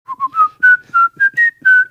whistling jingle; old spice

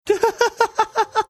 cute laugh

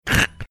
making microphone crackling sound

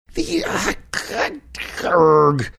making funny sounds

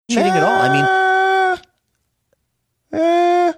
making funny unconvinced sound

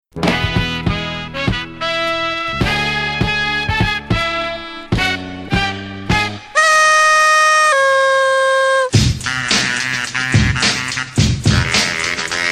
exciting celebrate music

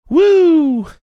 whoo

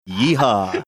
yeehaw

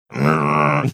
rrrrrrrrrr

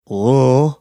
funny sound

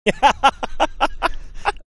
short laugh

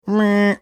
imitating sound pizza oven opening up

